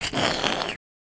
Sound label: Trex Snarl; creatures organic